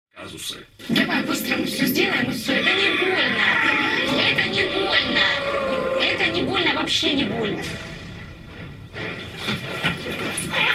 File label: For Drums